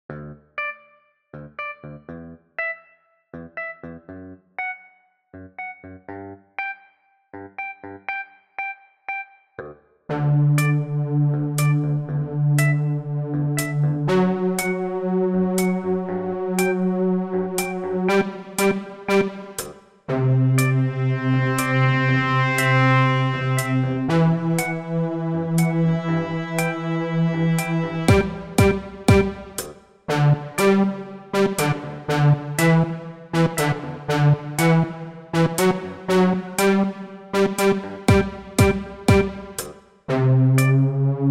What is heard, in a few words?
MIDI ditty; spellman studio